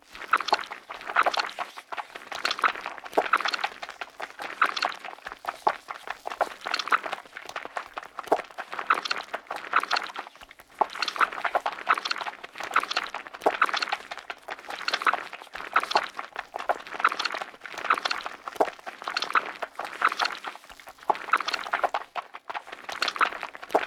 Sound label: tentacle; Monster girl quest